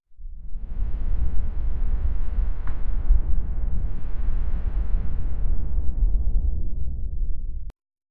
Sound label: slow rustling of wet fabr